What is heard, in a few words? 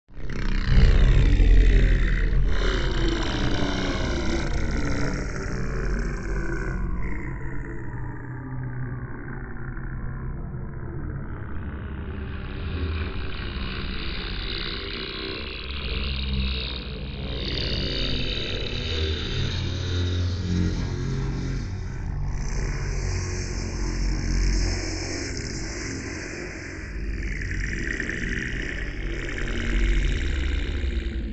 black soul growl energy entity drone; quad LR